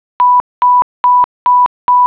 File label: Power Off